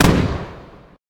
grenade launcher; ufoai; weapons

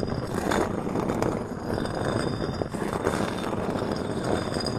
loop grind stone

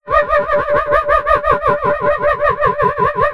gargoyle

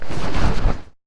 stand, footsteps

